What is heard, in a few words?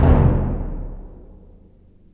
B Drum